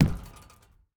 Street Ball Acrylic Back, Street Basketball; SFX Kit

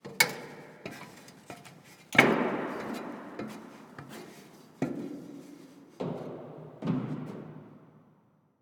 Semi Truck Tanker; Large Vehicles; Blastwave

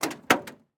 Semi Truck; Blastwave

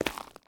zombie footstep concrete; DeadFright

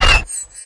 Humanoid Ranged Magical; Kilta

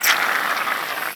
Foam Espresso Fill; SFX Kit; Foley - Misc

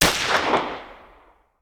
Hand Gun; Sound Ideas; Guns

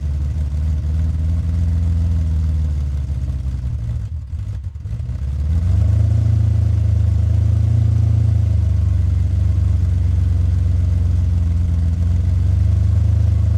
Lamborghini Idle Loop, Automobiles

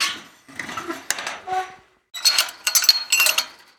Gate Metal Fence Close; Doors

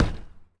Street Ball Glass Back

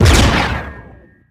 Grenade Launcher Shot; Weapons

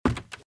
Footsteps - Wood - Creaky - Run - Left

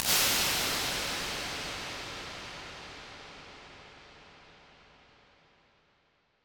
WIDE HALL, Impulse Response

